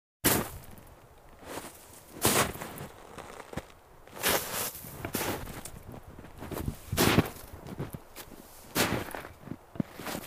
Snow footsteps

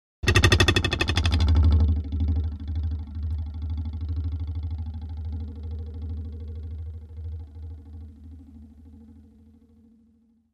Arrow trembles stuck in a tree; cold weapons

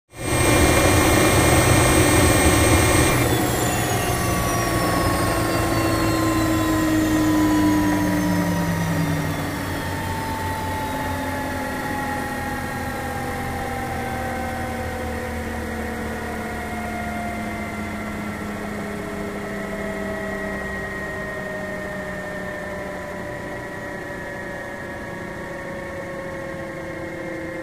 Helicopter lands, turns off

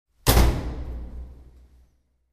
metal door closes

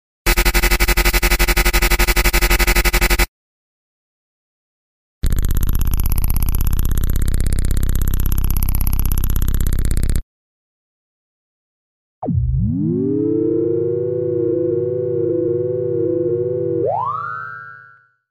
Electronic signal; radio signals

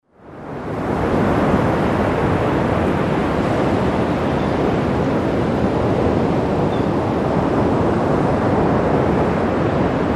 Surf, coast; sea

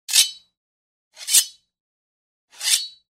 Two swords clash against each other; cold weapons

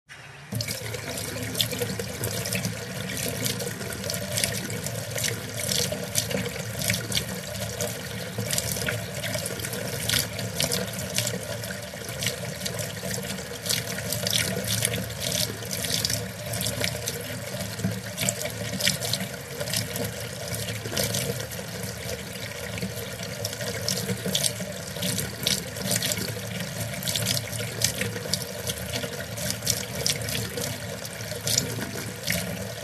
Water flows into a bowl

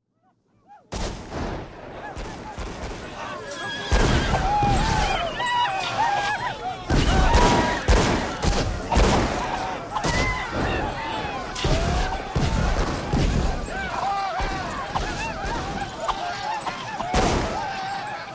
Medieval battle, explosions, crowd noise; cold weapons

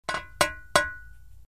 Knocking on glass

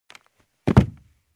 Jump in shoes on a wooden carpeted floor; steps

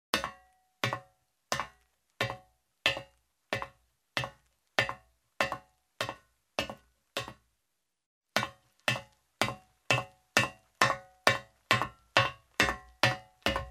Steps on a metal ladder in shoes

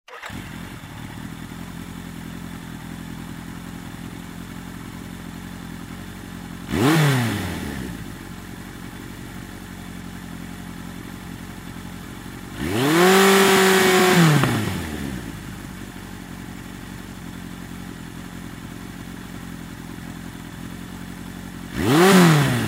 Motorcycle starts, regassing, jamming

